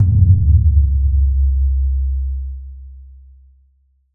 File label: Bass Clap